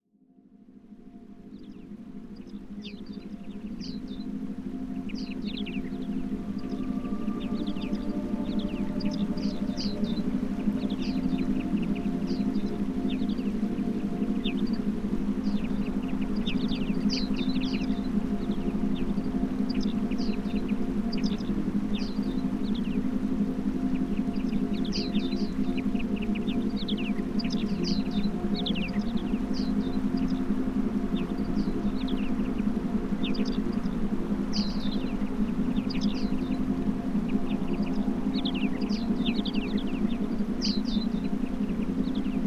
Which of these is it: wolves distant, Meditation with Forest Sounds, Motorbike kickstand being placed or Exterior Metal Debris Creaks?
Meditation with Forest Sounds